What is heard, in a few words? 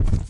stomp double